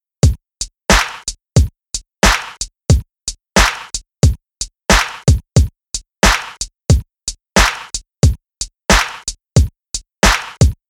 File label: Septuplet Swing Example